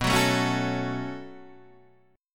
B 7th Suspended 2nd, Guitar, Open D

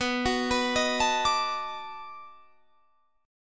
B 7th Sharp 9th, down, Guitar, Standard; arpeggiated

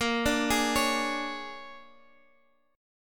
Bb 7th Sharp 9th Bb down Guitar Half Step Down

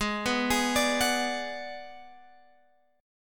Ab Minor 7th Flat 5th Ab, down, Guitar, Open G

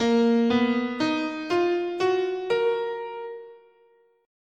Bb Japanese, scale

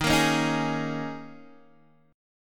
D sharp 7th Suspended 4th, Guitar, Open D

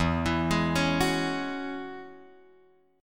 E 6th Add 9th E; E6add9 Chord {0 X 2 1 2 2} arpeggiated

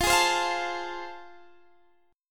A Minor Major 7th Sharp 5th, F

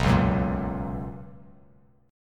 B Major 7th Suspended 2nd Bass Standard, chord; BM7sus2; strummed; JGuitar

